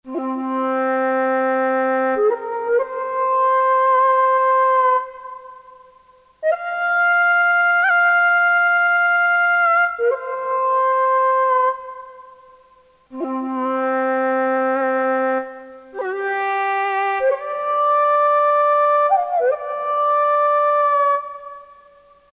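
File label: Shakuhachi, Japanese Inst; Korg DSS-1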